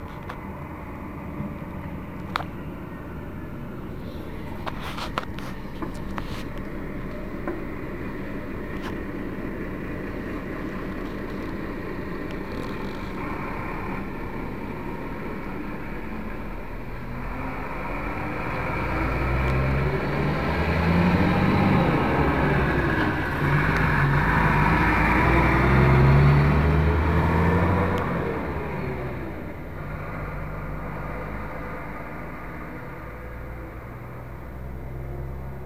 Irisbus Renault Ares, bus

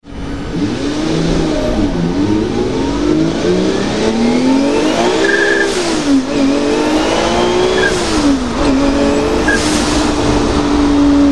Edonis - a bord

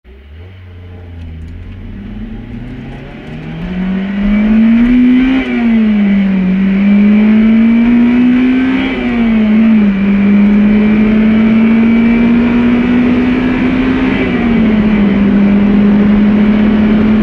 Honda Civic Type R

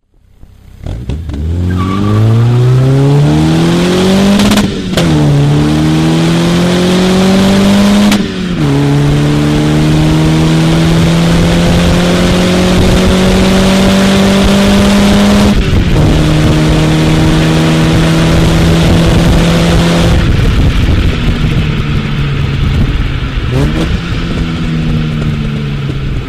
Escort XR3i Mk4, engine sounds; ford

